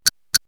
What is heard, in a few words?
Clang, perc fx; X-Static Goldmine